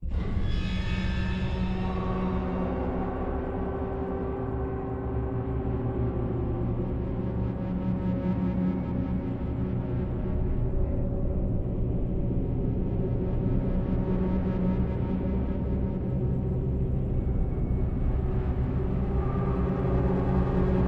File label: Death Drone